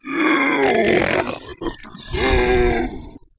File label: zombie idle; svencoop